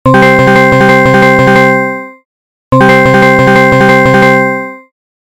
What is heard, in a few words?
ringer, tones; Clock